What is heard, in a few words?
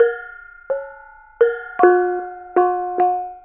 BONANG; Spectrasonics; Heart of Asia; BONANG PH